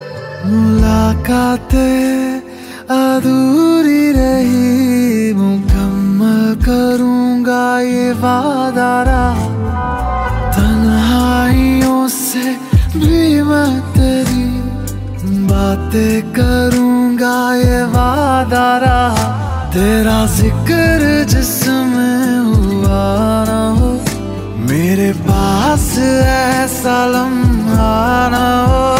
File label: Flute